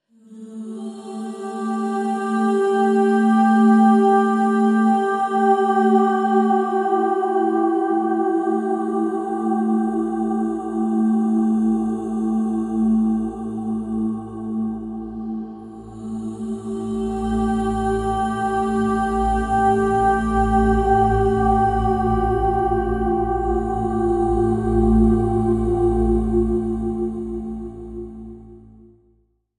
Equinox Drone

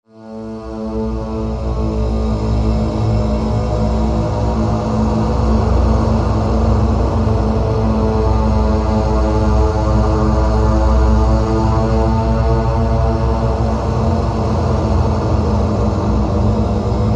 Doom Pad

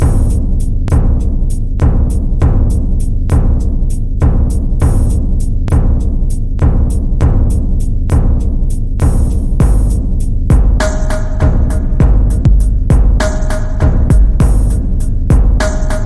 Percussion Only

